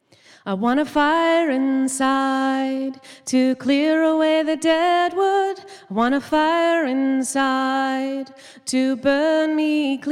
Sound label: Vocal